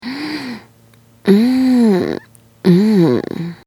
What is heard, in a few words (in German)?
stoehnen